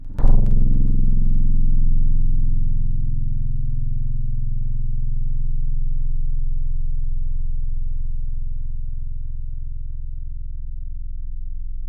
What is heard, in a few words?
Gibson Acoustic; Instruments; Synthetic's Serum Collection; Echo Sound Works Core Tables